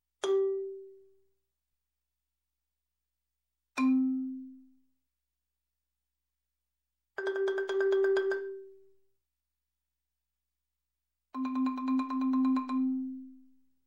Marimba single Hit In G, Music, Percussion; Sound Ideas 2000 Series

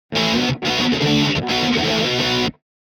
Guitar Rock Finale Rhythm; Hollywood Edge; sound designer's toolkit v2

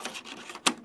Computer Insert Floppy Disk; SFX Kit; Foley - Misc

